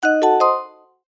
Multimedia Inquiry, Technology, Multimedia, Inquiry, Element; Alert Sound Effects; Alerts (Sound Effects:Multimedia); BlastWave